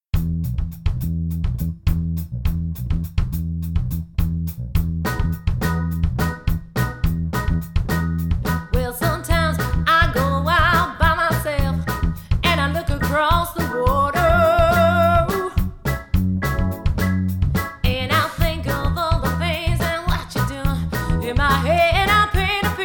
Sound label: groove